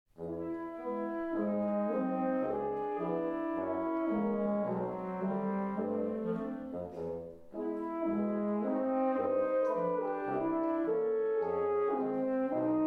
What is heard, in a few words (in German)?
Quintett, Andante tranquillo; Jean Francaix